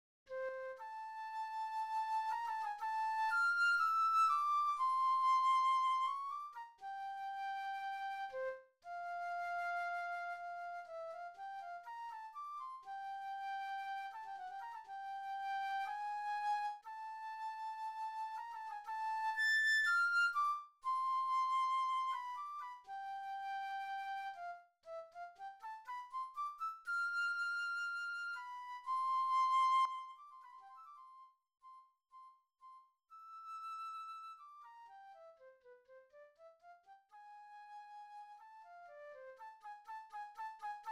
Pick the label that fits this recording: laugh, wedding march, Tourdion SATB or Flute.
Flute